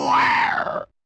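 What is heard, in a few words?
dead troglodyte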